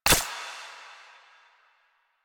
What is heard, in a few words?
UI Mod Rank Up Selection; DE; Warframe; Mods